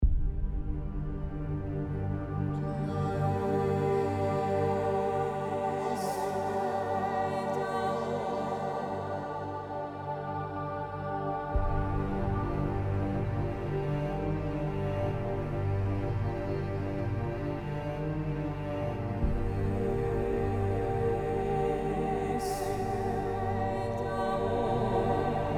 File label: end sfx music; sarif